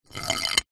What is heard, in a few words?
industrial ballbearing metal roll inside metal tube